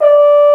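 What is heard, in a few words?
French Horn p D4; Digital Sound Factory Virtuoso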